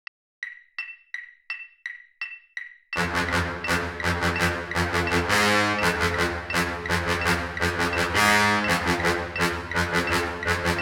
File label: bari sax bass trombone and tuba; marching band